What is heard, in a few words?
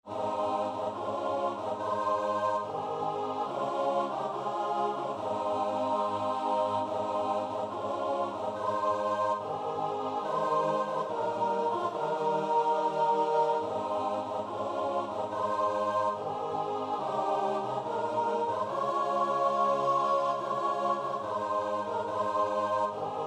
cadences